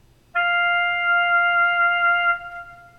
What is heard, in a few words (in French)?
instrument Fa saxo; chapitre 08 Signal sonore frequence niveau sonore